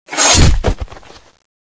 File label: guillotine